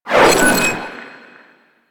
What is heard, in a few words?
Glass Shank Cast; DE; Warframe